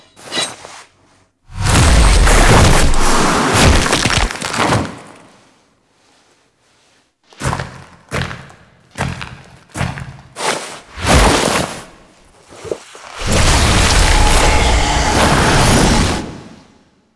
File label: Blade Against Throat SFX; Warframe; Duviri; Cinematics; Teshin